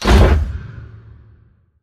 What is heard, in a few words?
pod door ext verb open stop; Titanfall 2